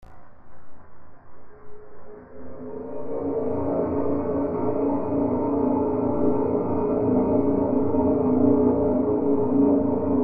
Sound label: suspense; DayZ-Epoch; dayz sfx